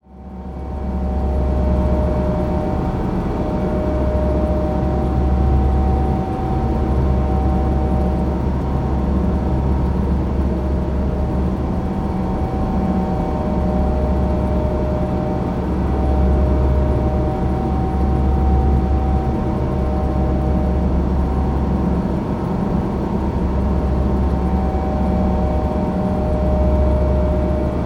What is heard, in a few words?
background machine room ambience; Bluezone Corporation